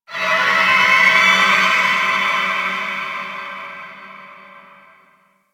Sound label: Ocean Underwater Creatures Small; Warframe; Grineer Ocean